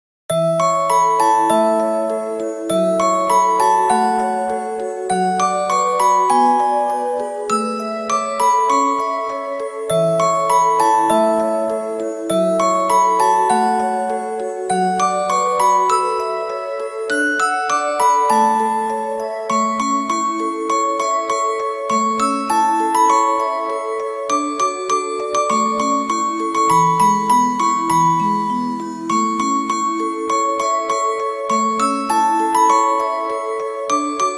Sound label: Jingle Bells, cisco ring